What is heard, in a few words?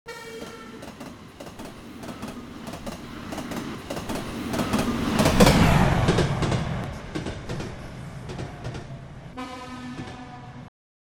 train doppler effect stereo; OpenAL